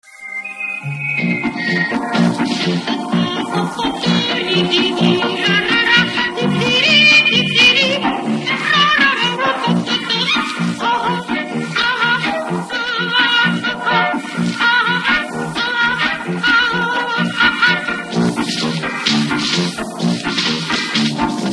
megafon music; sven; russian sounds

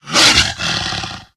pdog attack, pseudodog; russian; sven; russian sounds

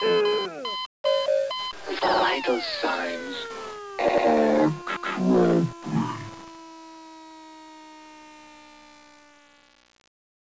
death, player helmet; bts rc

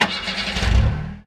car start, bus; russian; sven; russian sounds

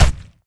zombie miss; sven; russian sounds

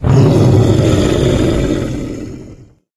giant death; russian; sven; russian sounds

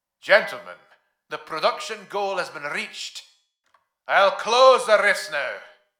daily goal reached

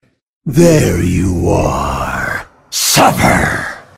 dead, grunt sounds

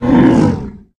giant hurt; sven; russian sounds

